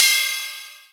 Big Drum Crash Sample C Key, Royality free crash sample tuned to the C note.